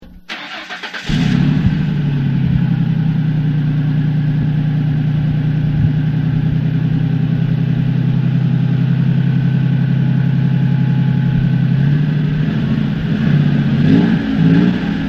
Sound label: sound ford, custom